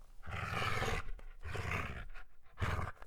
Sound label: angry breath dog; Apotheorasis